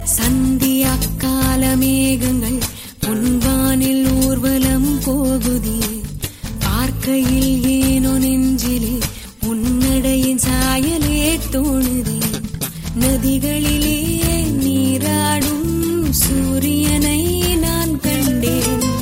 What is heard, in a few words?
New Tamil Movie Song Ringtone; Tamil Movie Ajith